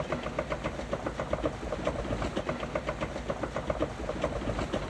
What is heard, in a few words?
log flume lift